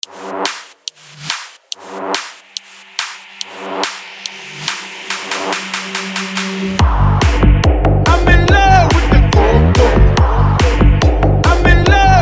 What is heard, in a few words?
Vina House Mashup